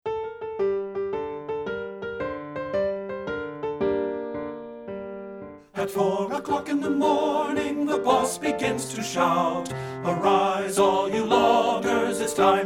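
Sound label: tenor, men, choral; partbypart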